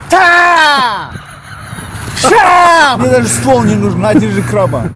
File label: kill knife; cstrike; ctf